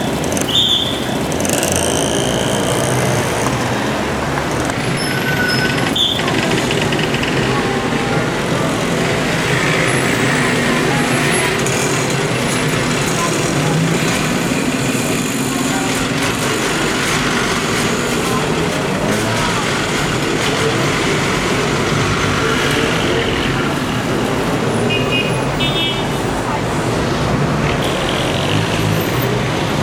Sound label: city thailand; best service Blue Box